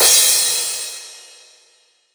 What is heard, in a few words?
909 CYM, Tape 909; Goldbaby Tape808 & Tape909; The Tape 909